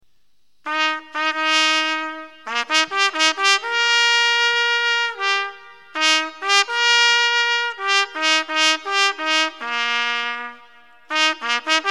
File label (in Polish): HEJNAŁ LESZNA